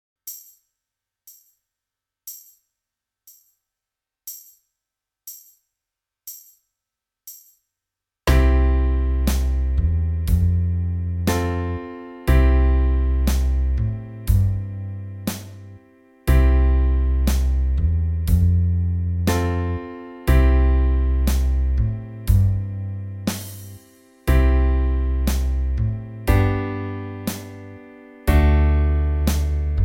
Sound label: band